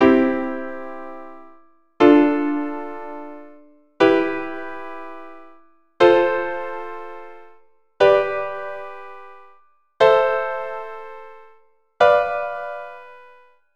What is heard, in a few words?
Chord construction, keyboard